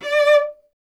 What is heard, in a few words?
STR cello D, Cello; Miroslav Vitous; Solo Instruments VOL-1